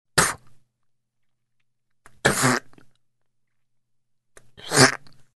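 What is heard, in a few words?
sounds of a person spitting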